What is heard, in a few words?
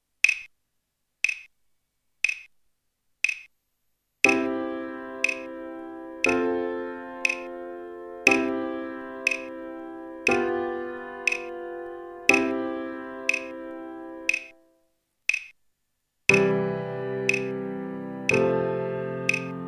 code progress